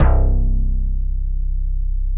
SLOPPY BASS

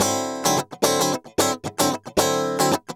GUI, 110 BPM

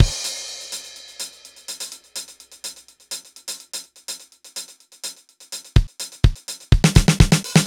DRUMLOOPS, Disco

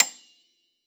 piano A6, Honky Tonk ff; Best Service ProSamples vol.53 - Classical Prepared Pianos; Total Piano

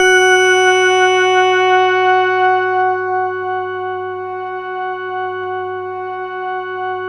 SYN PPG, Microwave; Sound & Vision - Gigapack I CD 2

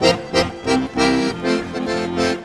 ACC BRK, ACC BREAKS; Best Service; World Colours

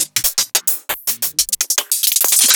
Closed Hihats; Sliced

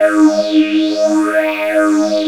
3100 E 3, Korg; Back In Time Records; Korg Universe VOL-1

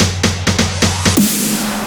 Fills 128BPM, Clubsounds

